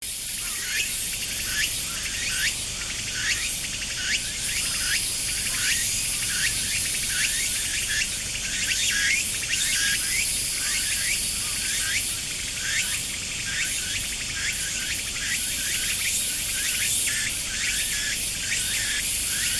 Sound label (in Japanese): semi tukutukubousi